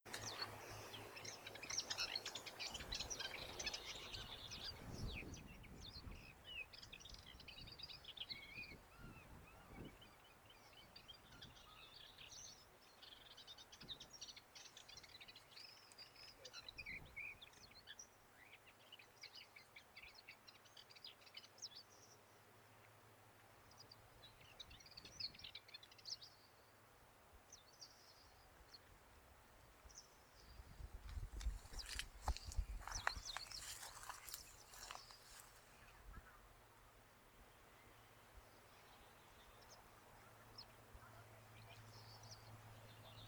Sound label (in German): weissbart-grasmuecke